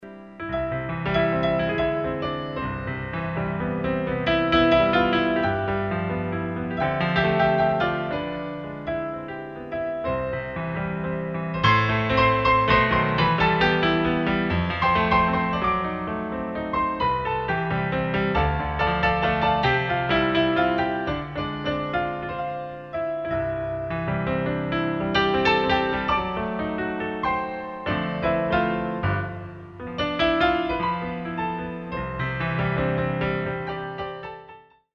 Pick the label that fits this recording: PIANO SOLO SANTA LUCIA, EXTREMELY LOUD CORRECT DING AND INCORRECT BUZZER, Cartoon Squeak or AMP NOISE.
PIANO SOLO SANTA LUCIA